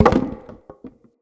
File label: Wood Boarded Wall Medium Destroy Chunk